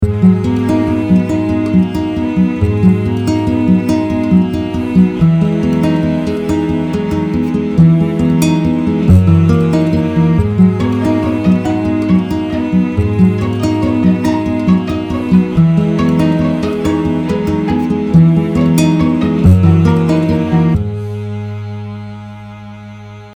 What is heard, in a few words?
Asian Classic guitar